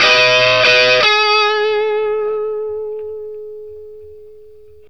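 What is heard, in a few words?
BLUESY G sharp 60, Vintage Blues Guitar; USB Soundscan vol.22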